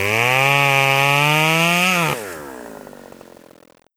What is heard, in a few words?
chainsaw attack; doom3